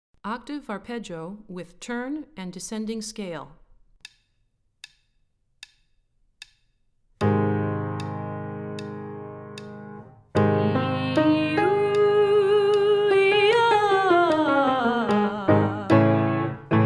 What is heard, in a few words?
Octave Arpeggio With Turn And Descending Scale Low, warmups